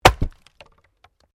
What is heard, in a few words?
Wood Chopping Axe; Objects